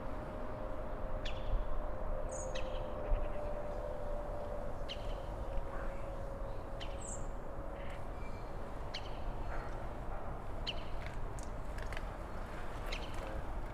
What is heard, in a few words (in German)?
blutspecht